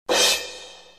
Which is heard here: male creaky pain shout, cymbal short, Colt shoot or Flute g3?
cymbal short